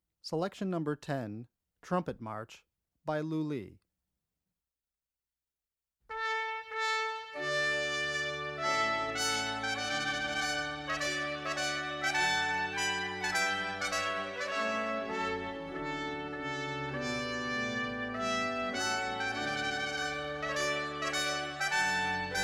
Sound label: Trumpet March; Lully; philipfeo